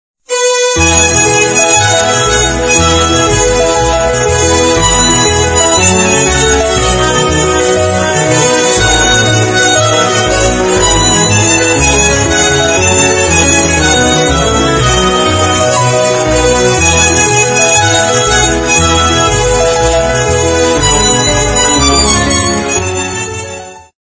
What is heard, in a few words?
Ringtone